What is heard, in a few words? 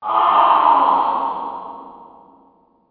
inmate agony; Earth and Beyond; EA Games